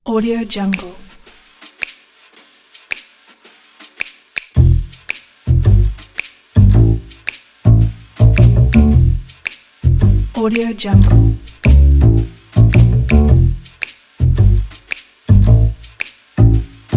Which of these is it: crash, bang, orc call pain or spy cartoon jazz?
spy cartoon jazz